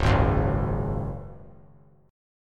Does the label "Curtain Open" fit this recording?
No